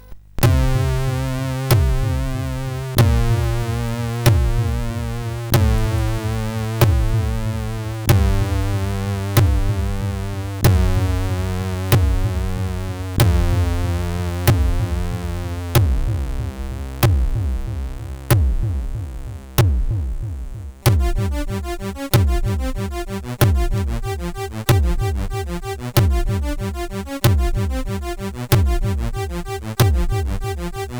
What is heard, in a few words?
Hyper Aggressive sid CSG8580R5